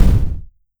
explosion; NIIIEMAND's explosion sfx

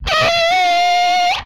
Skid, retro arcade

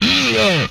Death, Vocal; retro arcade; MMRetroArcadeSoundsPack

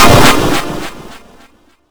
blow, electrical

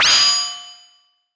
metal; Free SFX for anything

